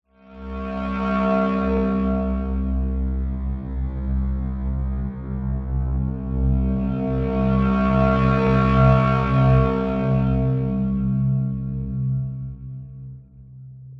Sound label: atmos sinistergrain